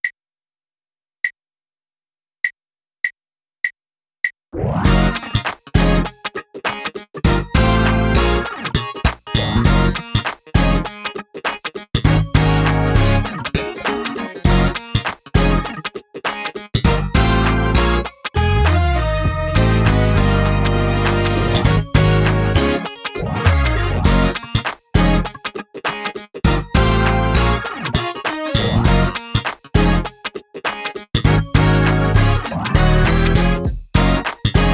Jam drumless

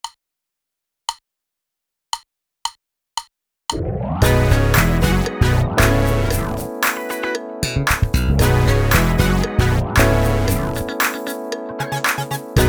Rude Funk drumless

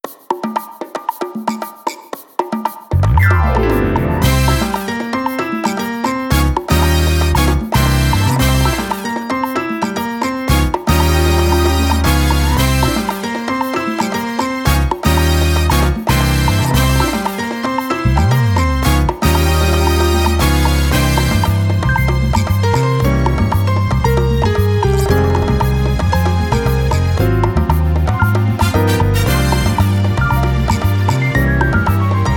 My Latin Jam drumless

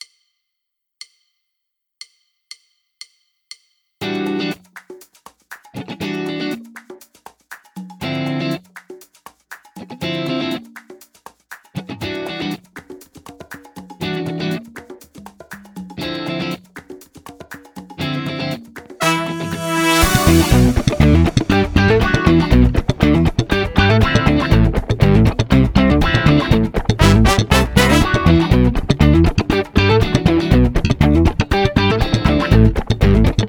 Casino drumless

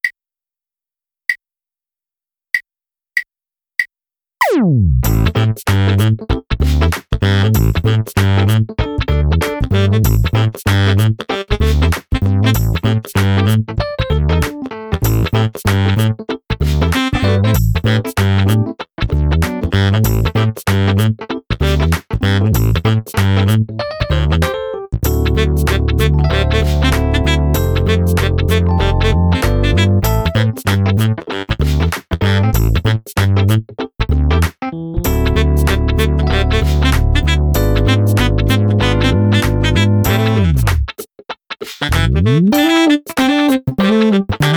drumless

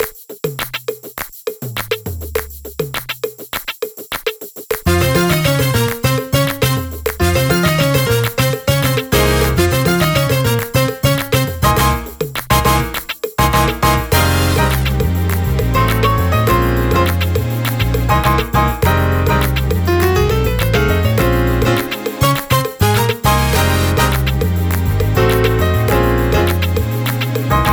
Latin Spin 102bpm

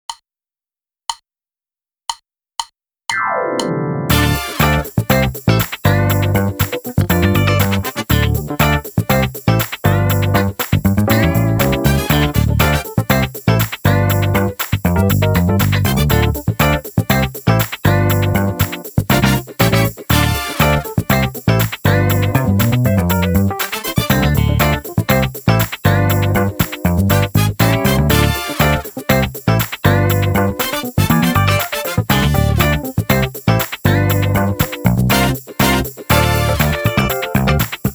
Funk drumless

